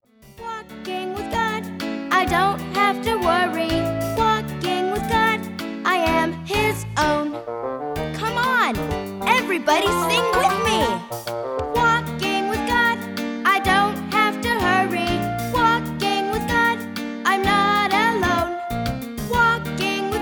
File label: Sing Along Walking With God